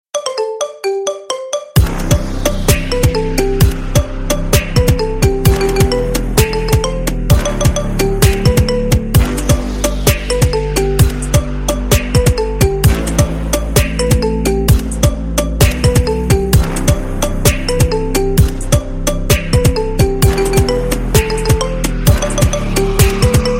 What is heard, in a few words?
marimba remix